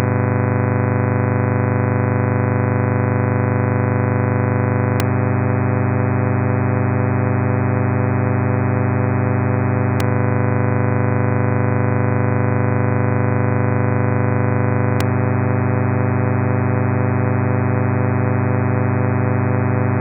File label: thirds